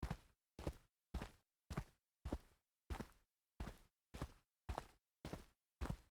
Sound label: walk dirt, foley